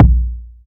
wet kick one shot a sharp key; kick drum samples

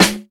sparkle snare drum g sharp key

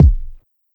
subtle reverb kick single hit D key

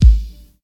verby bass drum single shot g sharp key

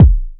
smooth bottom end kick drum e key; Duration 0:01; kick drum samples

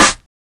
00s small reverb snare one shot g key

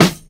studio snare drum f sharp key

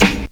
00s studio snare sample g key; snare drum samples

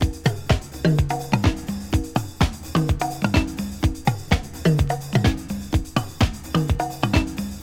126 bpm drum beat c sharp key

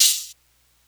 mellow open hat c key; Bitdepth 24

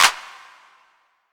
clap sound D key; percussion; single hits one shots

